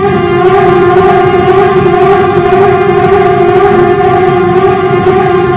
siren; quake2

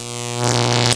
arc; quake2; dirtdemon

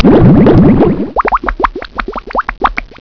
bubbles; quake2

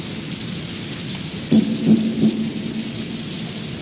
distant echo, weapons; quake2

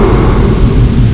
pumps; quake2

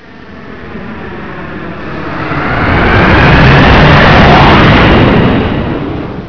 strike; quake2; weapons

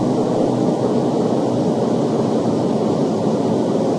vents; quake2

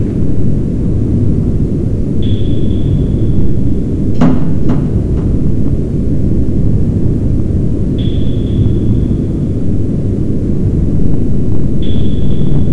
ambient; quake2

